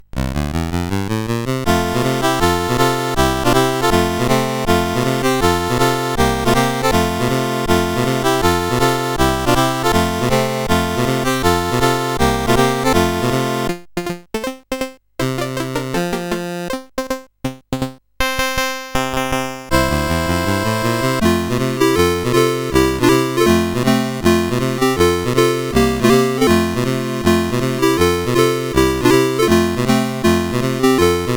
Alouette, CSG8580R5

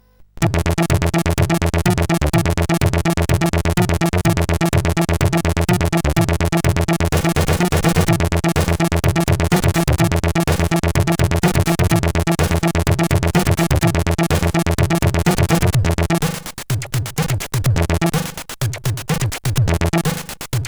Techno, sid, CSG8580R5

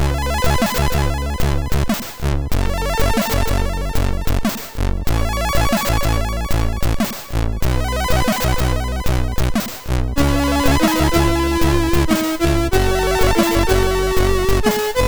End Tune, sid MOS6581R2

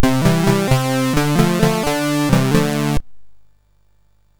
Fire Breath, MOS6581R3

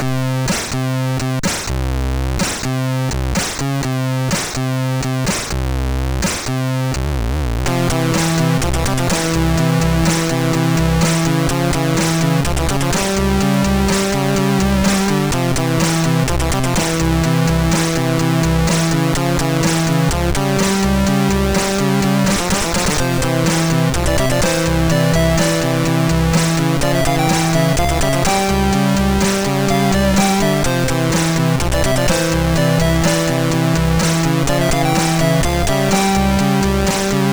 sid MOS6581R3; Anabola Melodier; Dexter

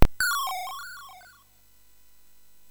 Fuzzball, MOS6581R3

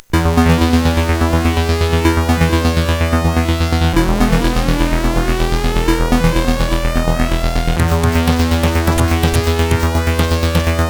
sid, CSG8580R5

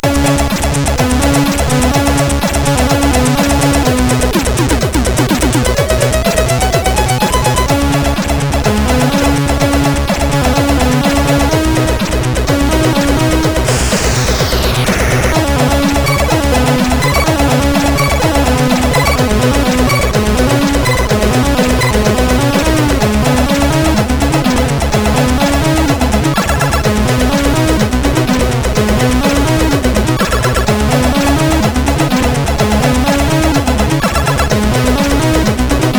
Short Introtune, CSG8580R5; sid

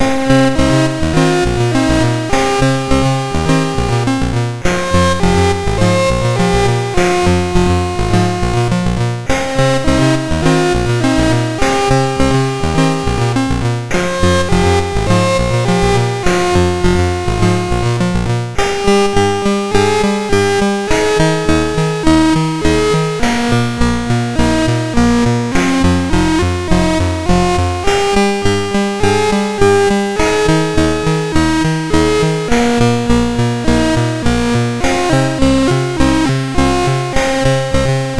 sid, MOS6581R2; Sledged

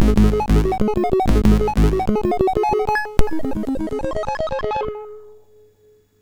sid MOS6581R4

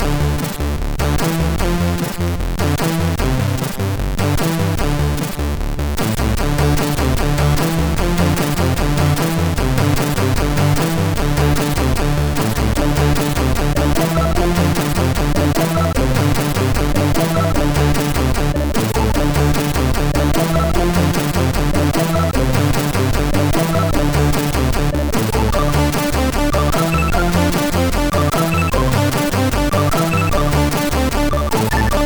Mayhem Intro, MOS6581R3

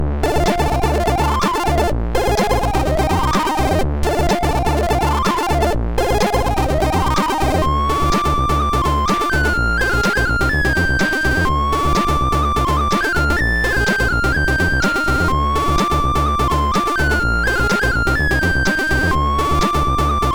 Menu, MOS6581R2; F A M Menu

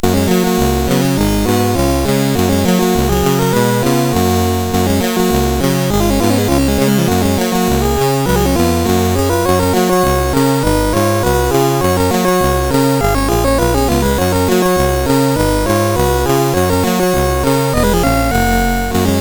Super Cup Football, CSG8580R5; hvsc

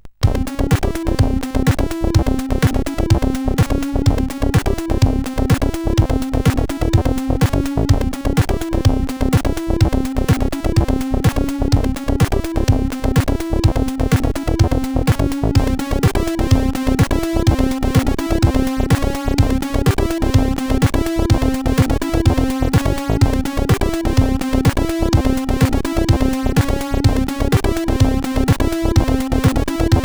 sid, CSG8580; Goatic; hvsc

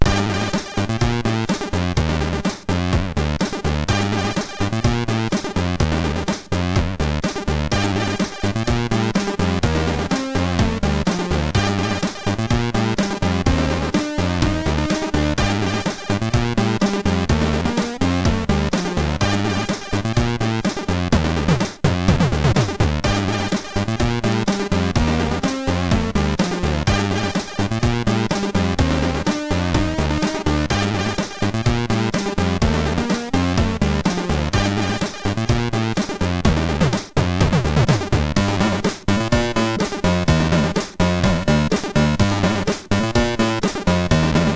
Mega Sound, sid MOS6581R3

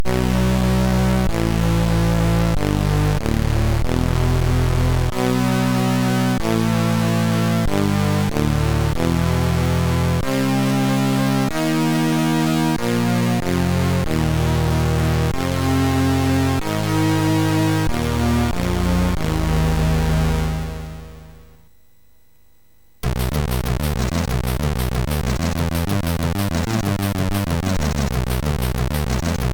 Tech Music, sid MOS6581R3; Warrior

